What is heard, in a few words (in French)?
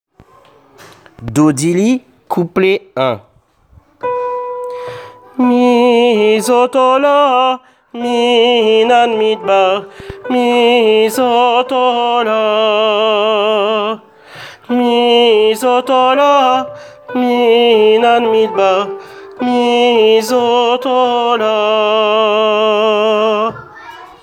vocal